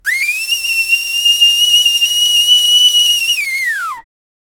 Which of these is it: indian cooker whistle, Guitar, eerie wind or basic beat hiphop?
indian cooker whistle